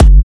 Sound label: Trance Kicks, Bassdrums